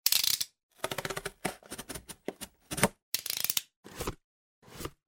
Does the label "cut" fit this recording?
Yes